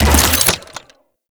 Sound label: Ball Projectile Hit; Warframe; Stalker